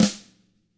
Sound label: TAMA SNRD; ILIO; Double Platinum Drums 1